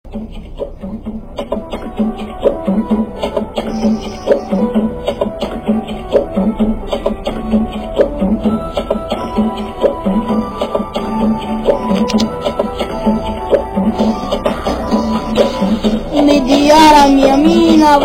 rap

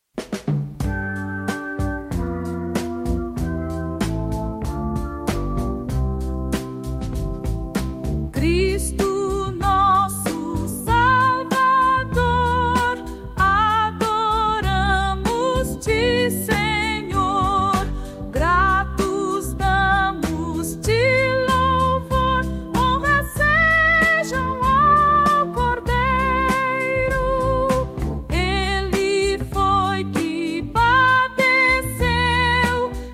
hino, harpa crista; honras sejam ao cordeiro

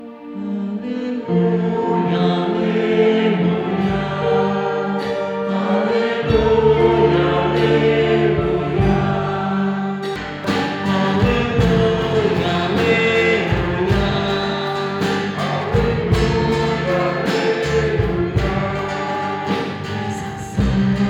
aleluia aleluia advento

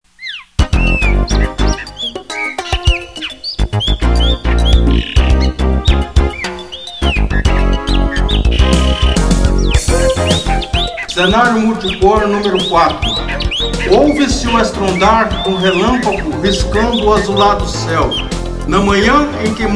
trilha; cenario multicor

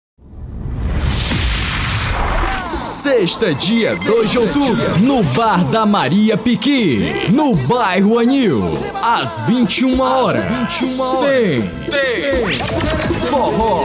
forro

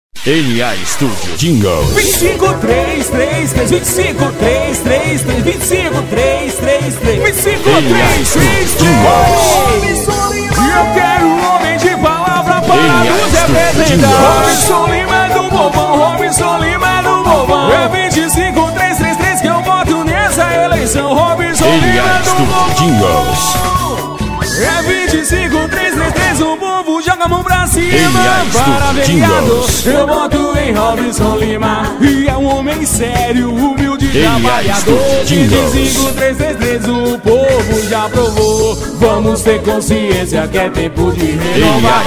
jingle pagode bahiano; musicas de politica 2016; n a studio

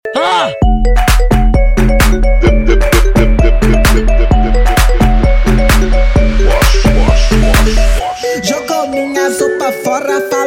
eletro funk

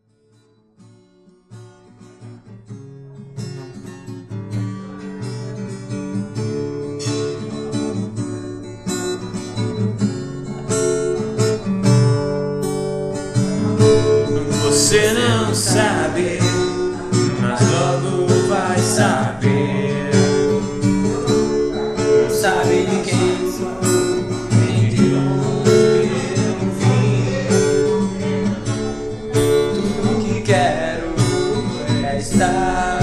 acustico

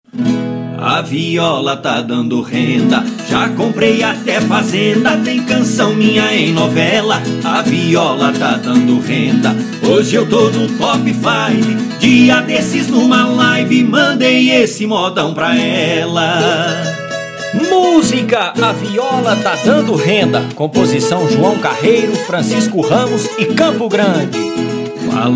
a viola ta dando renda; campo grande compositor

